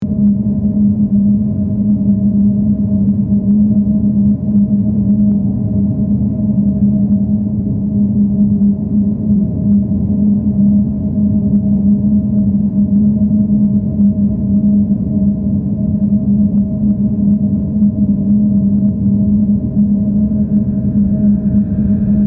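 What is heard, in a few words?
Ambience Alien City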